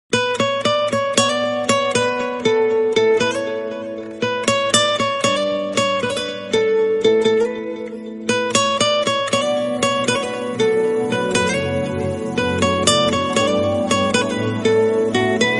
bangla ringtone

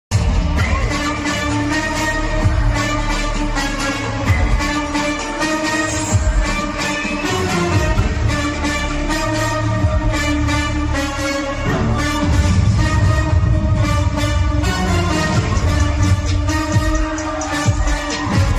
interval bgm, tamil; tamil movie; thalapathy vijay; venkat prabhu

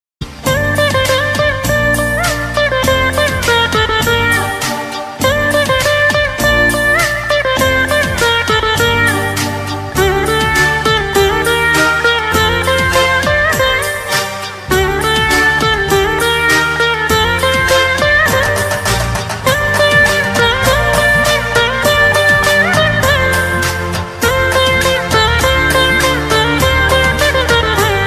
veena instrumental bgm ringtone; kushi

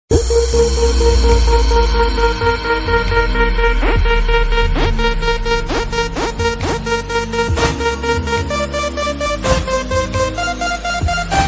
attitude ringtone; joker ringtone bgm

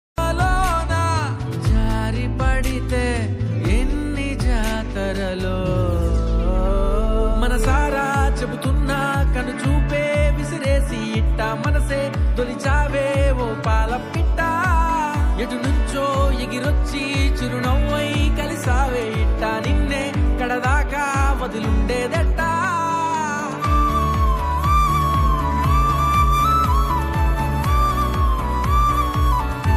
love bgm; terrace love story; webseries

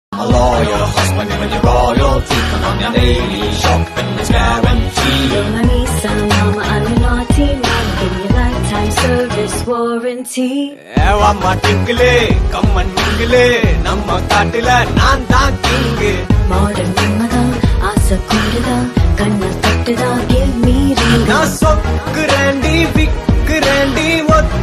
tamil song

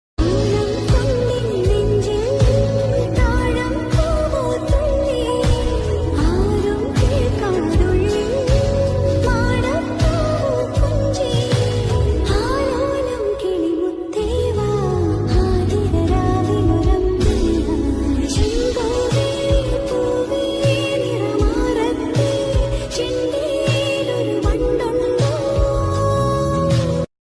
malayalam love song

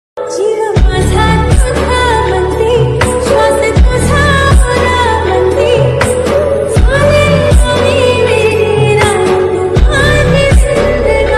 marathi love ringtone

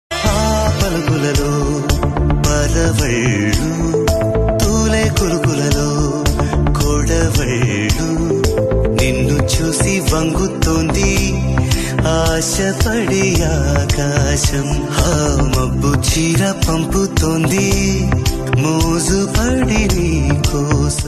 telugu love songs

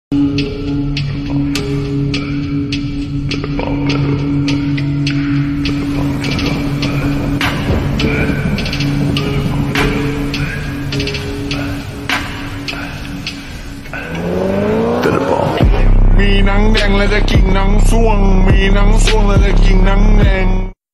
korean english ringtone bgm attitude ringtone

